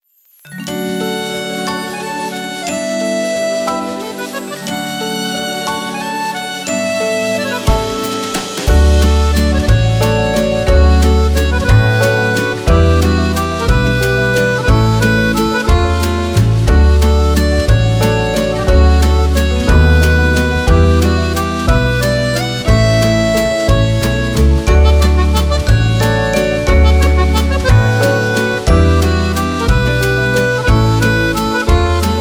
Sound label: Romantic Paris Accordion